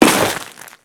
Crewman Step Ice, Footsteps; Warframe; Corpus

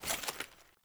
Foley Action Armour Walk; Player; MotherArmour

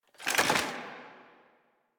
Rocket Launcher Reload; Weapons; DE; Warframe; Tenno; Lasrian

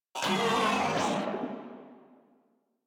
Moa Vocal Death, Enemies; Warframe

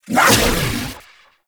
Archon Whip Whoosh; Enemies; Weapons; Warframe; The New War; Nira

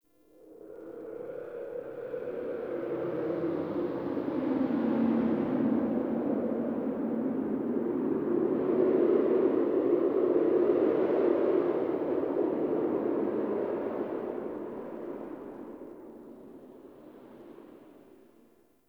Dark Wind; Warframe; Ambience; Nineteen Ninety Nine

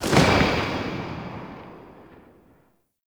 Infested Burst Rifle Tail, weapons

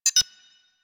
Bridge Comp Beep; Warframe; Hub; Liset Bridge

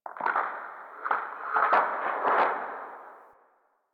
Landscape Glacier Ice Distant Small, Ambience; One Offs; Warframe; Venus Open World

